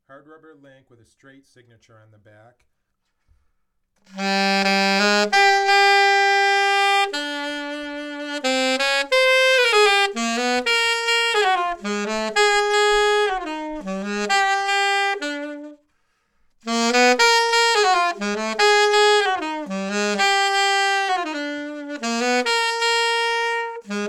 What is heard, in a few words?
Alto; FL